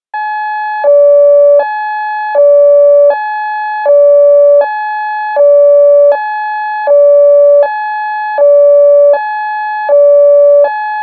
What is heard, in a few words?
alt steady